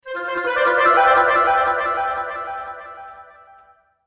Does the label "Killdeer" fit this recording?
No